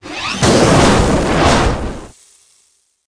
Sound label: Weapons Barrel roll